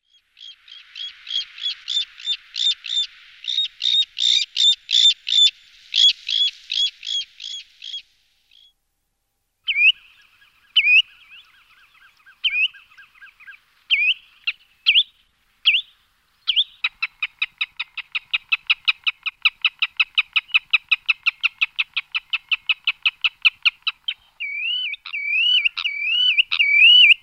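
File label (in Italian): totano moro, Canto degli uccelli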